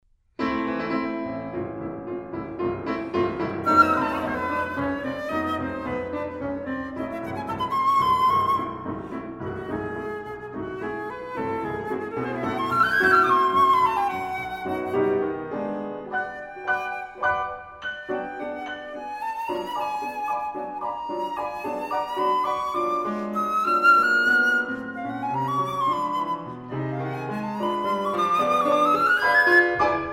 andante et allegro op 133 for flute and piano ii allegro